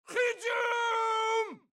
Fire; Age of Empires III; cinematics

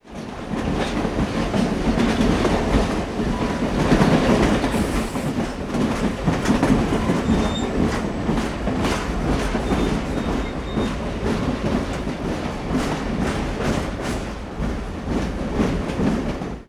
train boxcar clacks; Sony Pictures Sound Effects Series; Vehicles; Trains

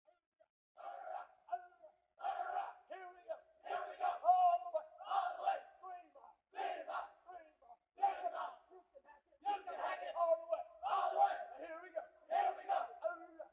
Drilling; Age of Empires III; cinematics

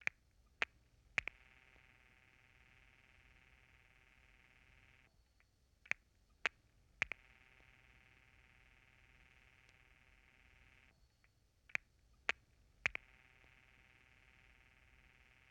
1930s phone clicks; Sony Pictures Sound Effects Series; Period Devices

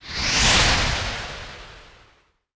shock; RYL 2 v1673

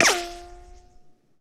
Rico & Whiz By Single; Sony Pictures Sound Effects Series; Ricochets - Hits - Whiz Bys

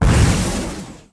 blast; RYL 1 v5.57

